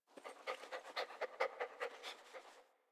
Puppy Idle Pant Long Frantic; Enemies; Vocals; Kubrow; Warframe

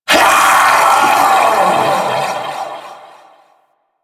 Vocal Death; Warframe; Duviri; Dax; Vocal Set A

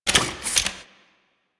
Fist Equip; Warframe; Tyl Regor; Fists

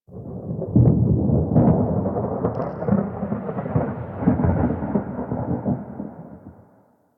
Thunder One Off, ambience; Warframe; Sacrifice; Vitruvian Memory

